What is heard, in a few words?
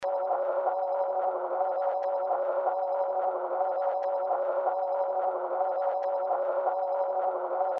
SKATES ON ICE